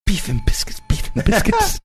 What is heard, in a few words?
beatboxing beef and biscuits